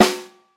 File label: snare one shot f sharp key